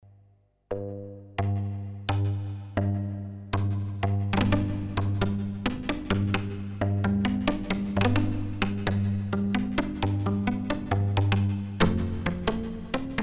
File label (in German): Hüllkurven